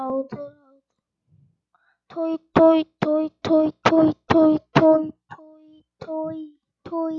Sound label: toy